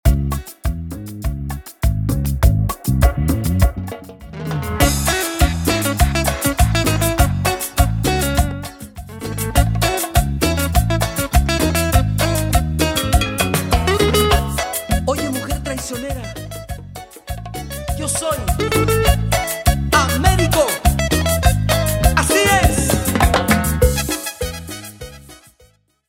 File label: Intro Outro 101BPM